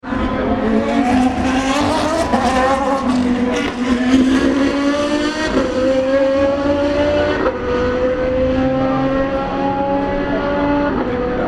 judd v10 engine after ford chicane

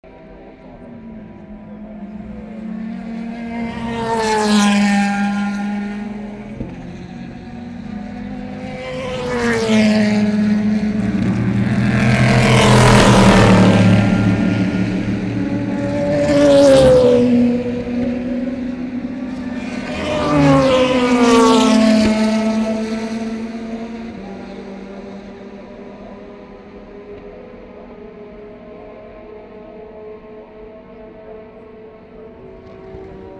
Cars in Tertre Rouge incl Corvette V8; Saturday afternoon

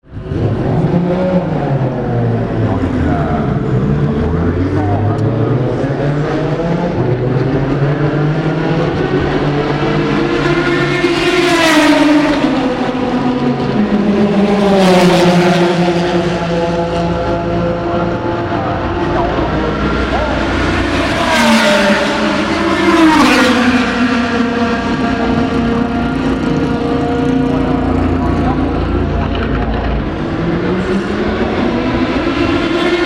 atmosphere at straight, le mans

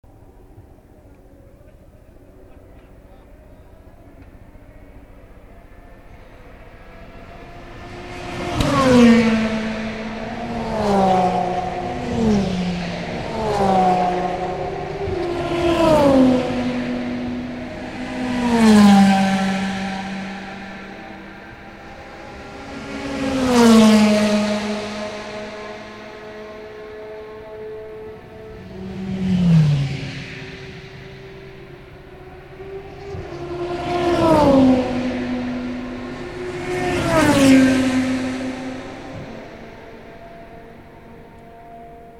Cars after Mulsanne Corner; Saturday afternoon

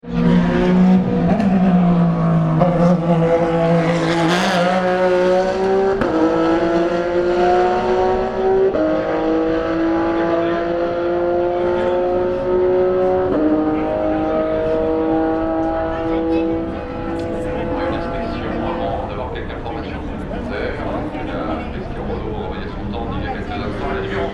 aston martin accelerates

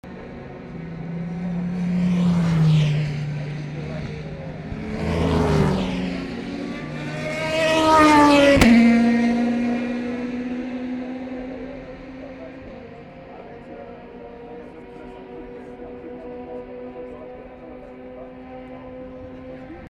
Audi Peugeot Zytek in Tertre Rouge

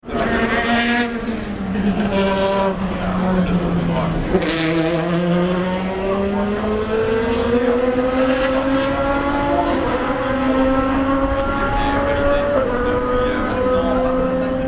ferrari f550 start finish, 24h le mans

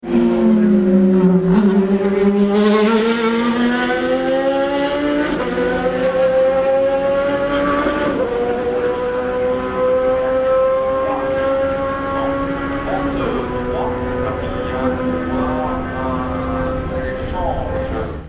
ferrari f430; ford chicane; 2006

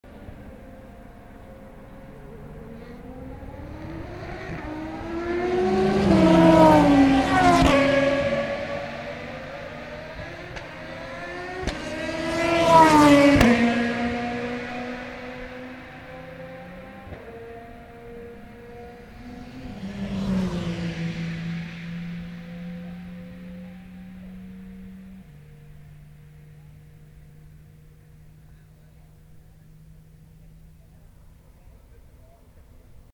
Cars after Mulsanne Corner; Saturday afternoon

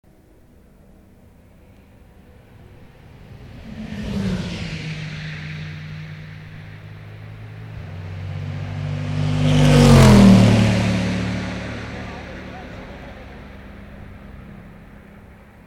Audi Corvette after Mulsanne Corner Saturday

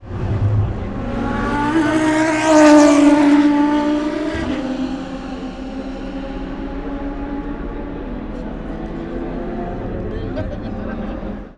ferrari 360 tertre rouge

